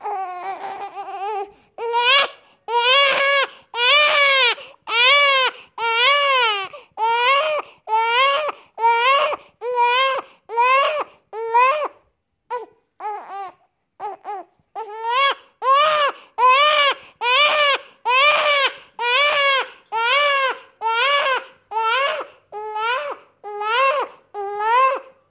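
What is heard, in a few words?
sounds of newborn crying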